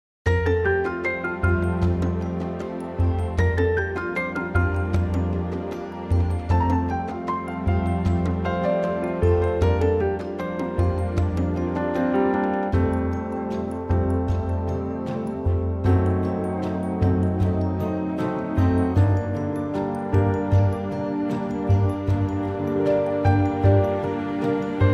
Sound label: orchestral; key - F; vocal range - C to Eb; Gorgeous orchestral reworking